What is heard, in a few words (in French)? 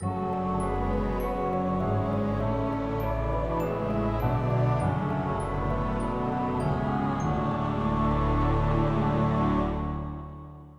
Essai orchestration